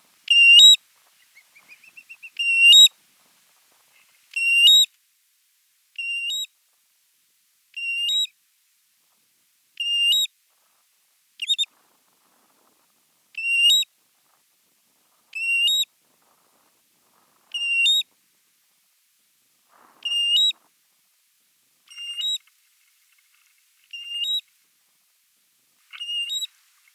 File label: American Golden Plover, Pluvialis dominica; shorebirds rails